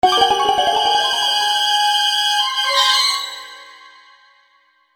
Orchestral Phrase; FXHome

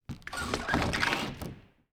Wooden Plank Scrape; 99Sounds; The Warehouse

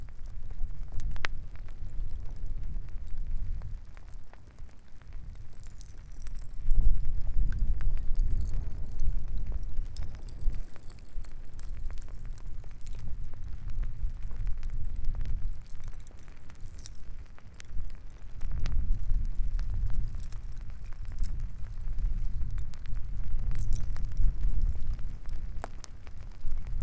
Lava Gets Close, Kilauea Volcano in Hawaii

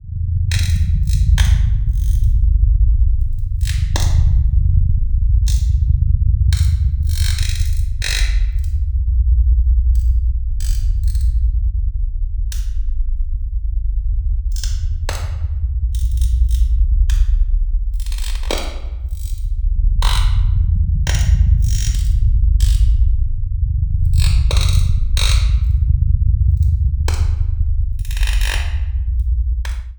biohazard atmos organic insect ambience; Organic Textures and Ambiences; BluezoneCorp

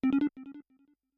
three tone; Kenney's Sound Pack; Digital Audio

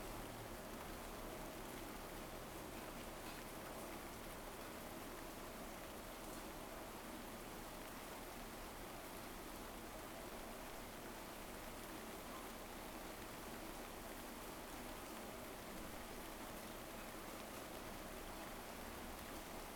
rain street; 99Sounds; Rain And Thunder

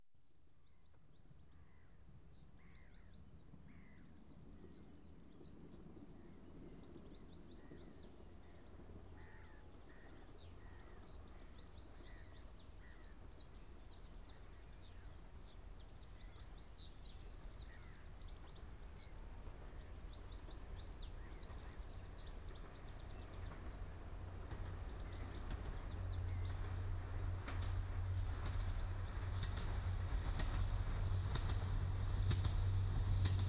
Trains Passing Diesel railcar; BBC Sound Effects Library

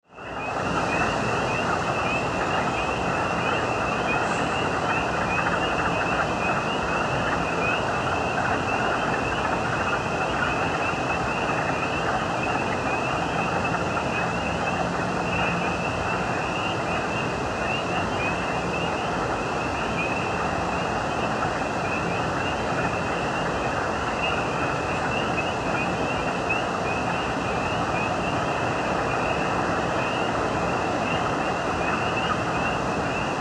Country Night Front, Ambience and Weather; Mechanical Wave

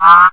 creature, Retro Sounds; Kenney's Sound Pack; Retro Sounds 1

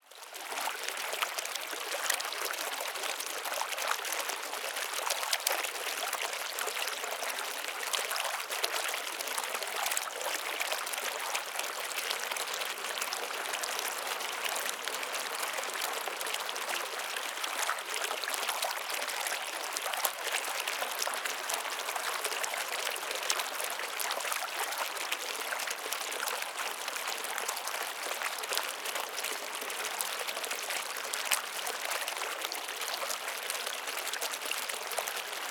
Water Fountain; Soundopolis; Fienup

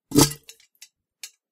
pickup, Helmet; Kenney's Sound Pack; Foley Sounds

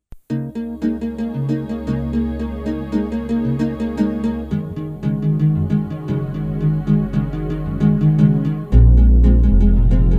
Electro Chill

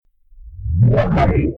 time start or stop; FXHome

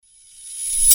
Sword Sound; FXHome; Fighting Sounds

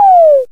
lose; Kenney's Sound Pack; Retro Sounds 1

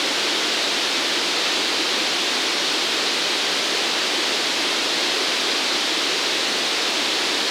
Drop Noise, White Noise

